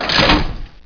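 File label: big lever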